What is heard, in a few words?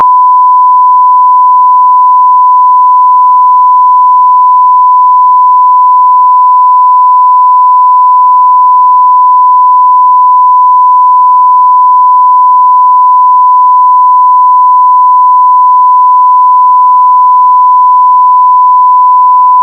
1kHz sinewave, freq shifts